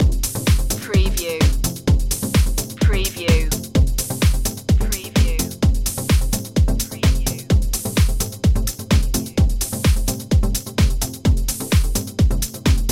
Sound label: progressive